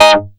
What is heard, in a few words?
short chor; Masterbits; Vintage Voltage; SEQ-FX